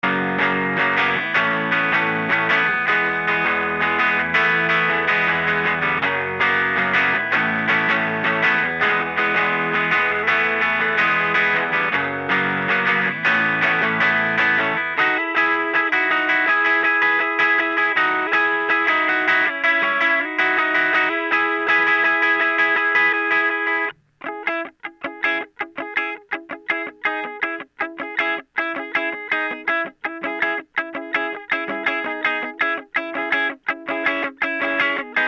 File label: el gtr; Hybrid F.E.T. Opto Compressor; B172A 17 Electric Guitar; Compressors, Black Lion Audio B172A